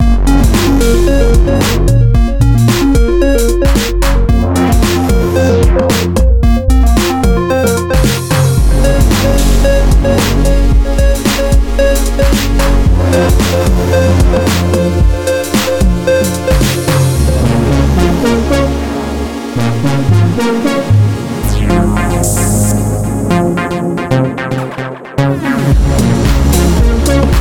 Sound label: Bleep